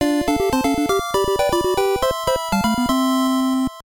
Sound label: Stage Fanfare; the little mermaid nes